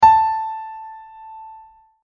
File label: A5 audio music note